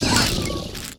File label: VOLCANIC GRUMBLE WAR DAMAGE